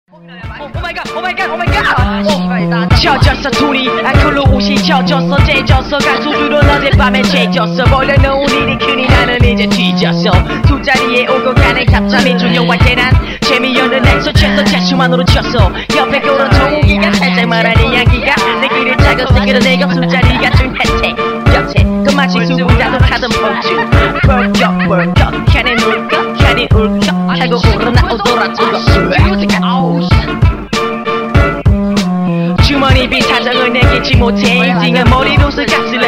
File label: Barf